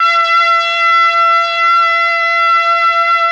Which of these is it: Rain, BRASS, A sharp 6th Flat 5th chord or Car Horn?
BRASS